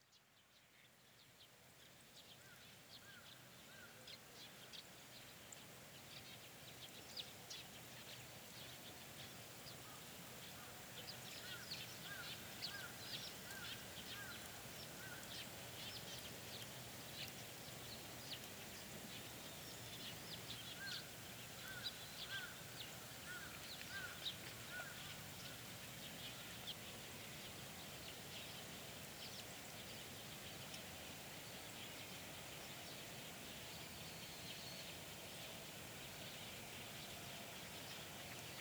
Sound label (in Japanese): 沼環境音。さまざまな鳥、虫、蛙等の声; 「ぽんぽこ沼」